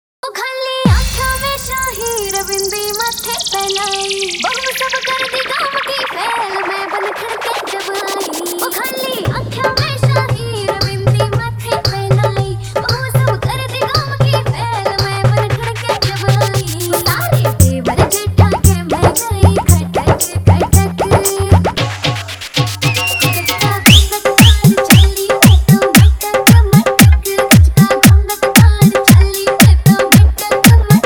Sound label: Haryanvi Song Dholki Blast Mix